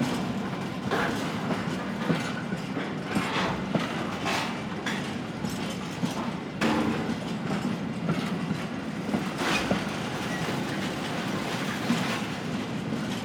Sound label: train slow med damage